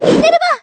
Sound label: cannon; celica; minerva cannon